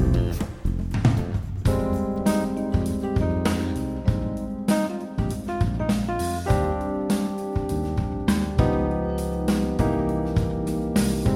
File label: Jazz Band; Orlando